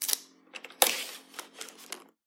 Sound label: taping; soundscrate